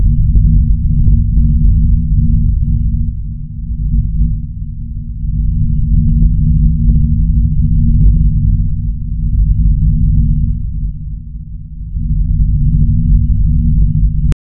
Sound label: scifi car backfire